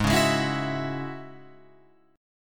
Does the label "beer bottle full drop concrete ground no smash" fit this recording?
No